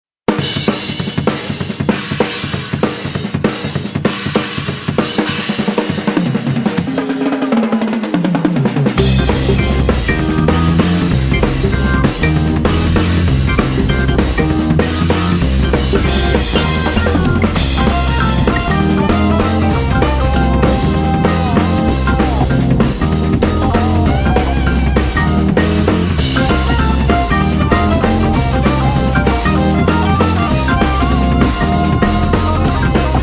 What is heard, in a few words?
Woogie; 7 Wonders